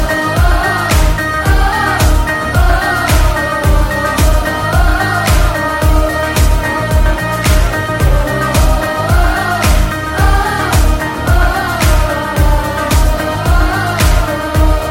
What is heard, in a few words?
Instrumental BGM Ringtone